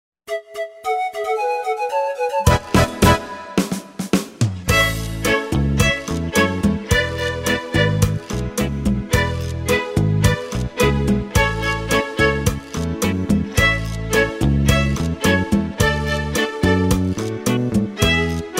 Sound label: chachachacha